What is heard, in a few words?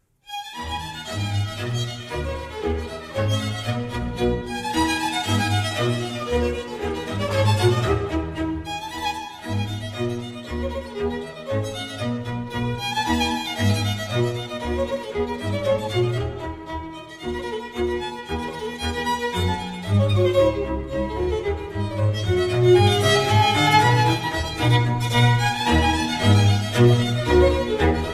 Anglaise Ou Bostonienne